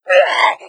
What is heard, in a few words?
pain, hazmat zombie; doom3